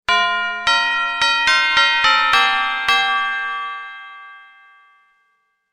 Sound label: Christmas doorbell sound